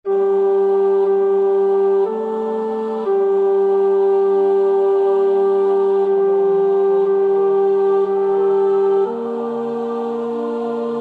tenor; Nieuw Liedboek; NLB 932 (Rust nu mijn ziel); Vesperkoor Bunschoten-Spakenburg

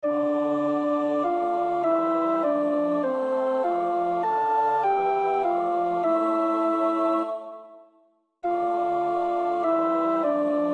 Psalm 2 vers 2 en 4 (alt)

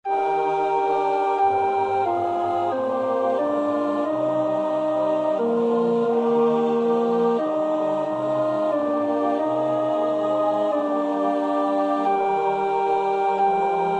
Maak ons uw liefde, God, tot opmaat (alt); Nieuw Liedboek; NLB 974

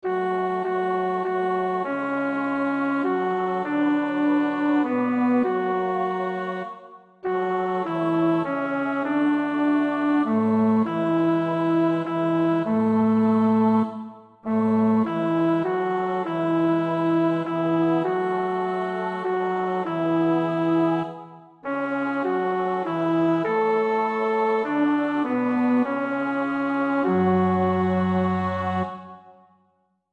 Psalm 150 (bas); Schütz (Heinrich)

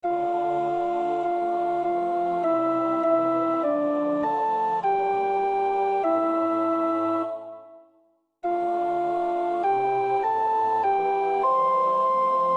Psalm 91 sopraan; Oefenbestanden; Claude Goudimel

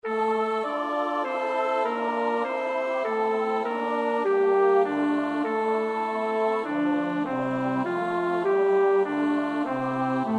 bas; Nieuw Liedboek; NLB 23a

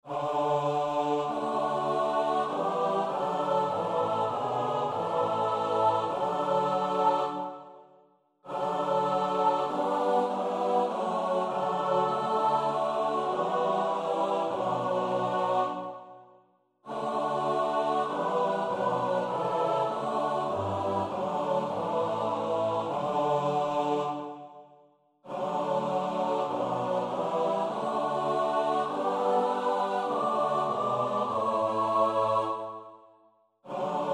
S-A-T-B; Nieuw Liedboek; NLB 84

